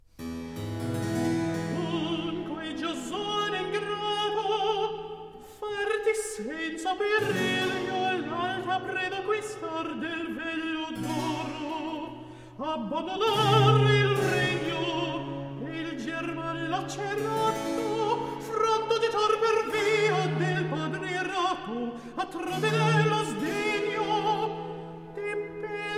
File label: Cantata Medea in Corinto for alto two violins and continuo Recitativo; Caldara